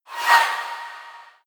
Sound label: New Mail